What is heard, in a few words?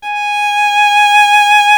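violin G#5 right; Greytsounds VOL-1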